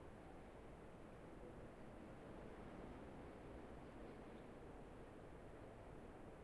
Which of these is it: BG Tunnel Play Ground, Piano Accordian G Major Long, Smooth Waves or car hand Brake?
BG Tunnel Play Ground